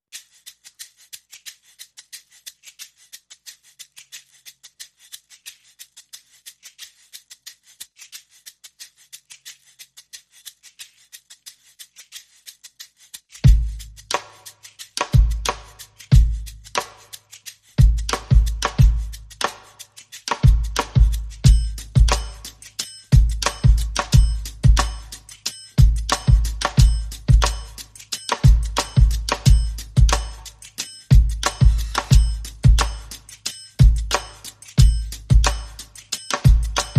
Drums and Perc Only

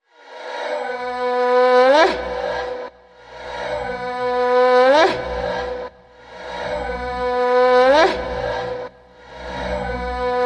Scary Vocals Pulse

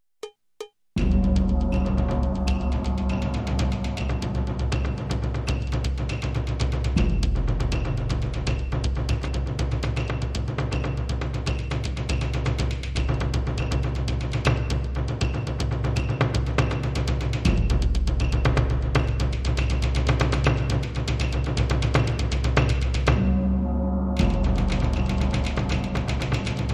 Japanese Loop

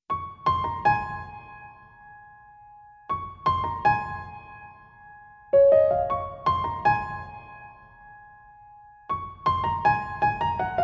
Twinkly Pianos Mix

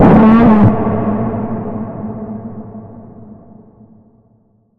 Bend, A

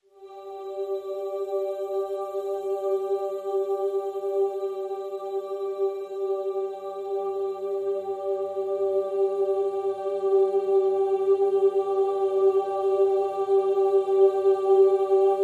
PADS Vocalicious (G)

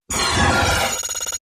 Sputter Ring

